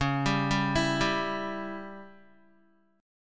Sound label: Db Diminished Db down Guitar; arpeggiated; 4F,4A,5C,5G,5C,6E